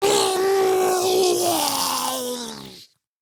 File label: Attack Roar Grineer Ghoul; Grineer Ghoul Expired; Battle Barks